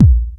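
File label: house bass drum; USB Soundscan vol.11; Drums Dance & Groove; AKAI; HOUSE KIT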